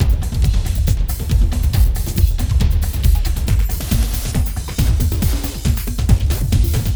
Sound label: Clubbin' 138